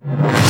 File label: Sweeps Short